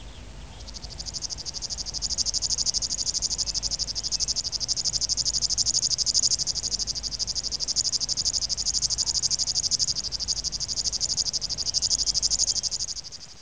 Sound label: Locustella fluviatilis; Сверчок речной